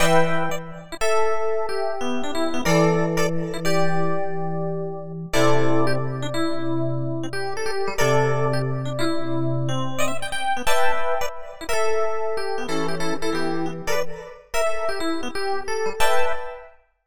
DX Tines

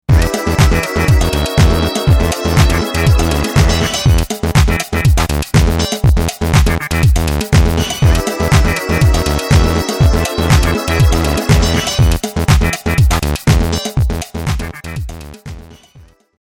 Garage H; EMX

